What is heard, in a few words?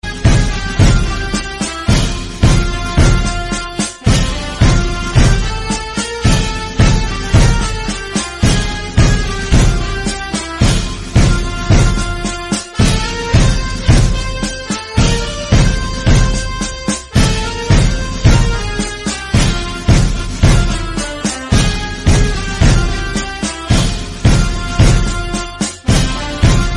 Army Band Sare Jahan Se Achha